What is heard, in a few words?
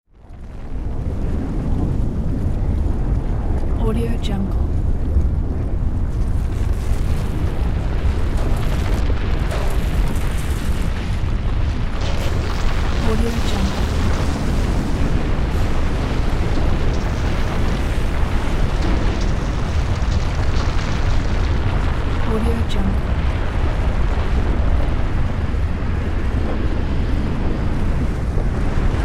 volcano